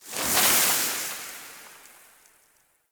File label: Title Card Stinger; Boro; Warframe; Tau; BoroOverlook